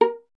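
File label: STR VLA A2, strings viola; Miroslav Vitous; Solo Instruments VOL-1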